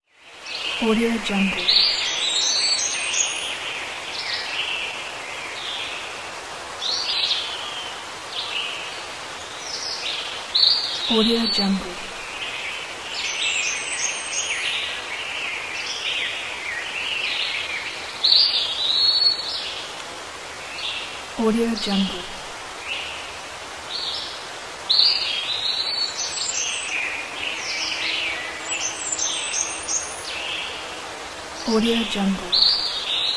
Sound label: forest